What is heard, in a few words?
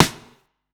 Snare, Drum Hits; breakcore; breaks